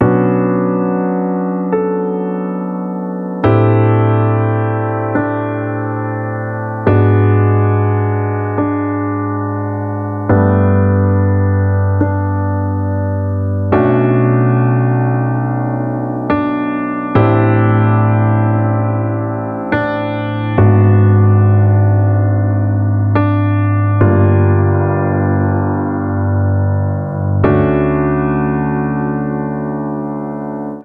Unique Piano Intro Deep Waters D Maj B Min 140 BPM; nikos piano sample pack